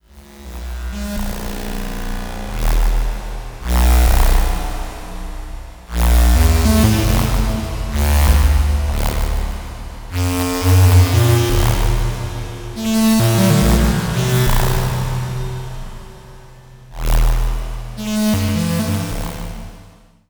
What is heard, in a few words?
krell music